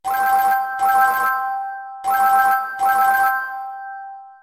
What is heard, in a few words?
Ringtone